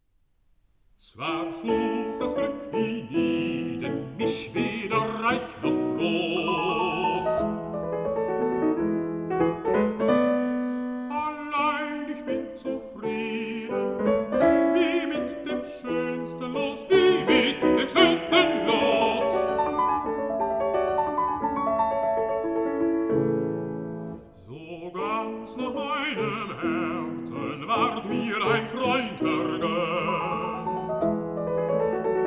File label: song for voice & piano